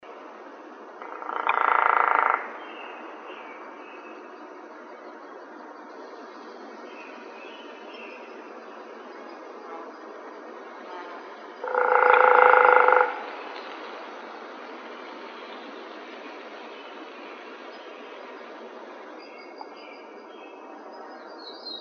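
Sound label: Hairy Woodpecker drum, Woodpeckers; HAWO; deviche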